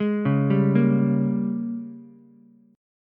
G sharp Major 7th Suspended 2nd Suspended 4th Ukulele; JGuitar